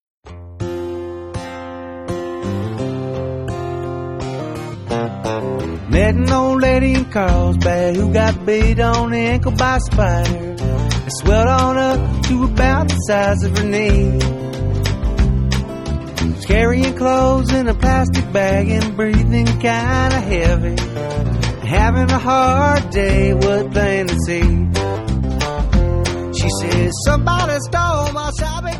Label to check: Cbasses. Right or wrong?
Wrong